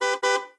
Car Horn; blue archive

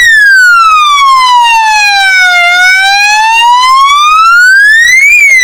wail, sirens; west mids

